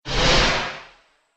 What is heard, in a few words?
Modulation Whoosh; Whoosh Sound Effects